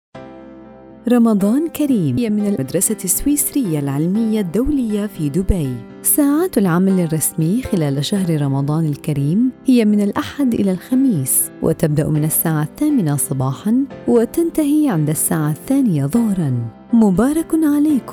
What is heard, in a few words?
Arabic Ramadan